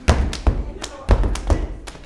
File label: SLAP STOMP; Blues & Soul; HOW IT IS